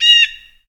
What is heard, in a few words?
Rand Bird; moul-assets